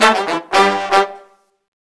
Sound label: Disco Fever, BRASS-LICKS